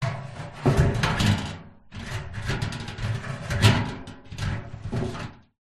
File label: household trash can metal lid movements